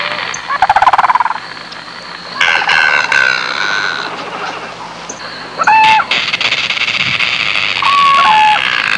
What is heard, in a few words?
Tropical jungle sounds